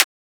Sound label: Transient; FLPRO